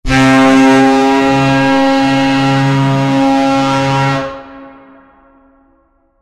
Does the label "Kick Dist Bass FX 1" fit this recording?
No